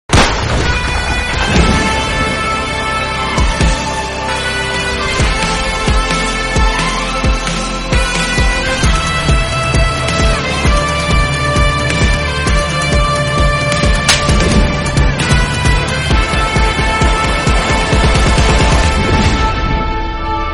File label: BGM Instrumental; Tunka Tunka Movie